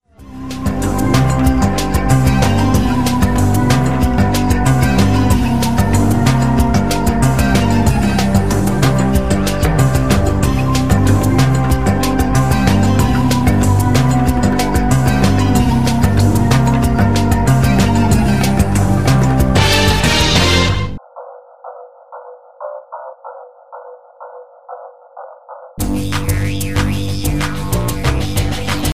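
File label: suspicious detective music tone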